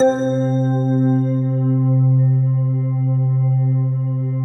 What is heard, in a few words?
PAD, DIGI-PAD; Best Service; XXL 800 Pads; Sample CDs